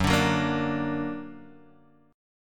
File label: F sharp Augmented F sharp Guitar Half Step Down